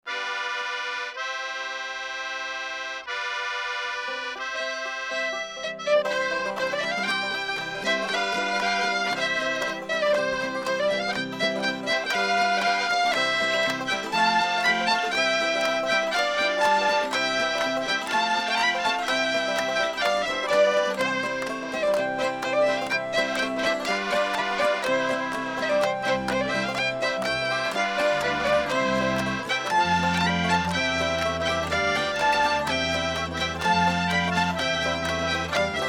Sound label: Mennonite Folk Song; the dog stars